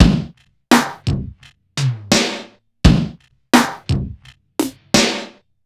Breakbeat, Drums & Percussion

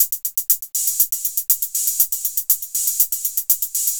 Dance Drumloop

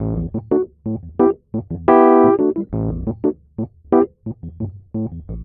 E. Piano

